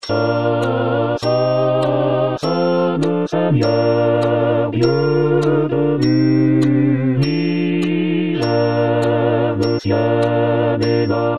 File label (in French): Sanctus, Chorale; Paques